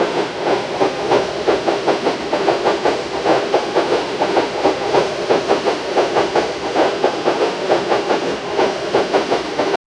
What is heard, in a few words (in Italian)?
treno in corsa, train